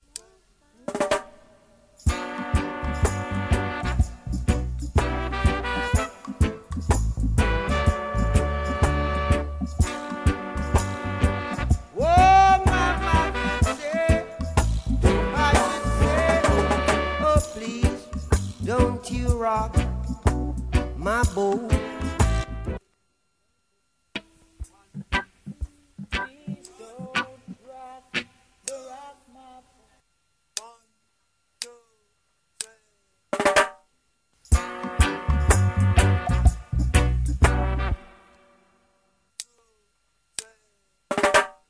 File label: Horn Mixes